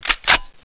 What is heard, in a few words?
thompson draw, weapons